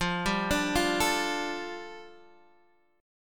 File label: A 7th Suspended 4th Sharp 5th, F